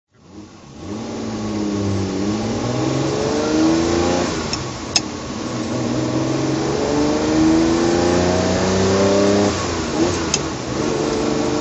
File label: ferrari 330 drive